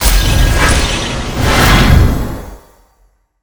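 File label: Boss Ancient Guardian Green spawn, monster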